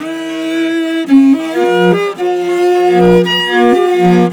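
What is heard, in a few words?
VIOLI LP, MORIN violins, Asian Traditions; Soundscan vol.60; AKAI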